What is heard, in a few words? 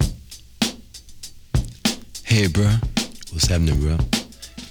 102 bpm breakbeat sample c sharp key